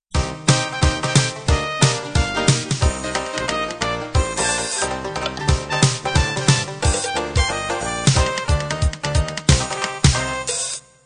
dixie end

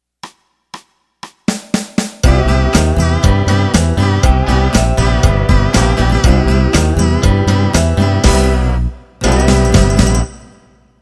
Modern Country Intro

